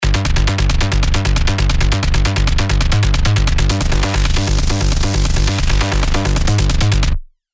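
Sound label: bass, Sylenth1; Xenopsychedelic; alonso 18 year anniversary pack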